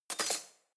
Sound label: cutlery spoon drawer place hard